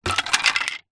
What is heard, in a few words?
insulation tile pieces drop; foley; zapsplat